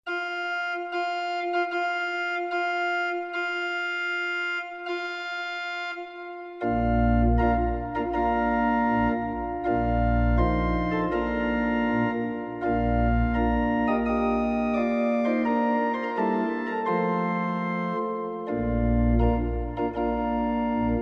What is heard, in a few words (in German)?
Wagner Brautchor